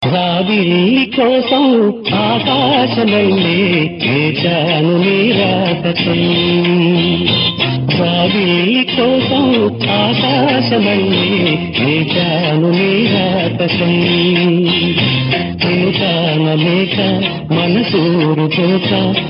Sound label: telugu ringtone